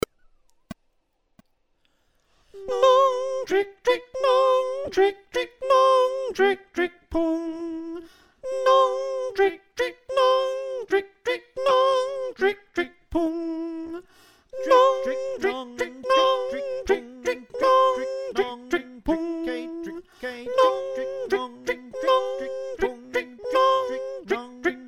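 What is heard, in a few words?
soprano, choir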